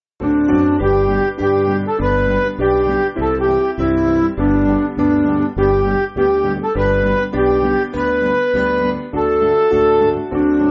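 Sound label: Music Backing Files for Churches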